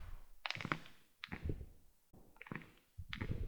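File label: Popping Knee Joint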